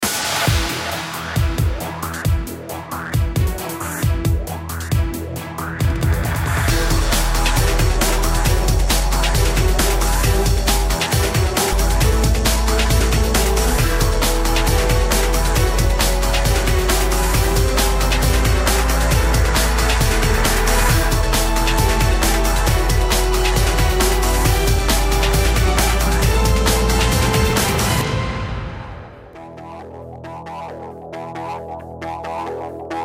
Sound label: bgm track2 loop; crossfire; rival factions